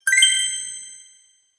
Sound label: event gem